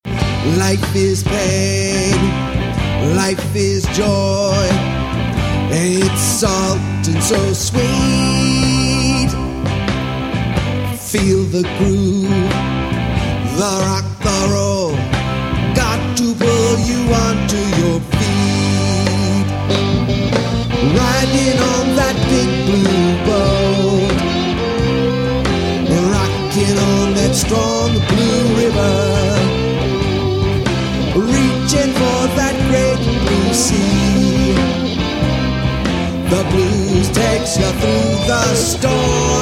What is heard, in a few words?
blues